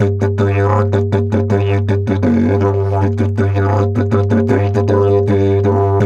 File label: Didge 80 BPM, Didgeridoo